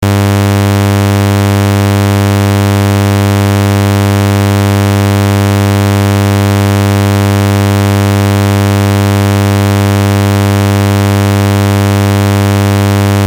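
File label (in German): Sägezahn Ton 100 Hz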